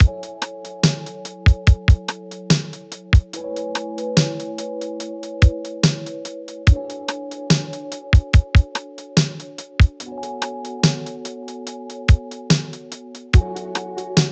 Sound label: Lo-Fi